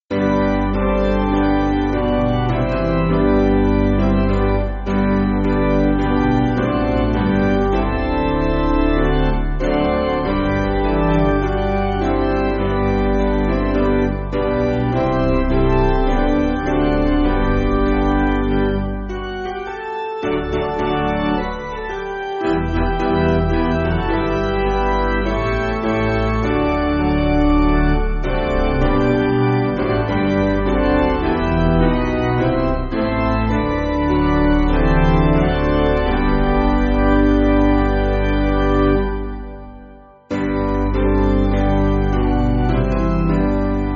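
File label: Piano, Free Music Backing Files for Churches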